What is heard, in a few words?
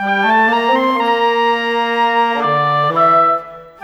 Winds, Orchestral, Rock Pop